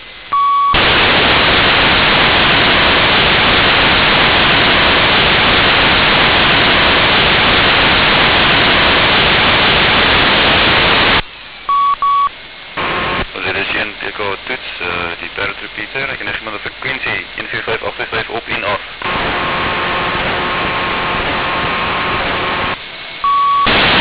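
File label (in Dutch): papegaai, parrot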